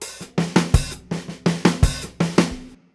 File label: 081, Fills; Zero-G; Monster Beats